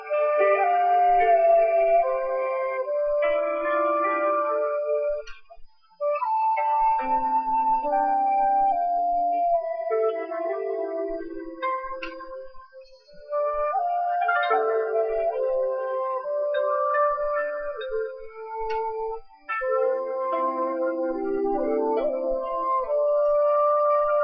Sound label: japan flute